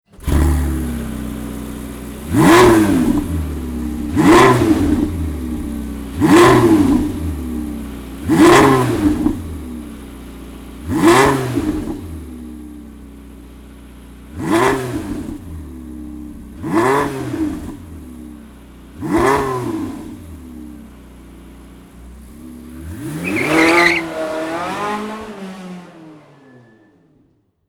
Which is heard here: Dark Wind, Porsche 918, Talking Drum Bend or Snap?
Porsche 918